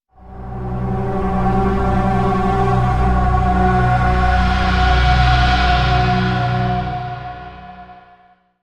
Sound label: game over; Resident Evil